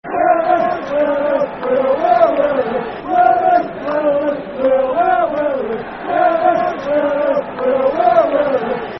We Are The Reds [Football Chant (Soccer Song)], Aberdeen